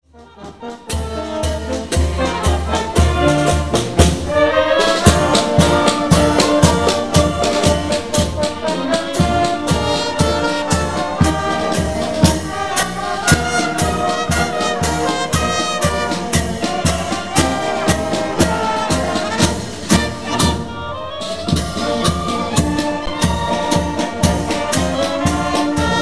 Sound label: barga band